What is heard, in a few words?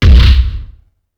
tackle down; UFC Tapout